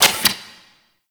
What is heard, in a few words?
Fist Movement; Warframe; Tyl Regor; Fists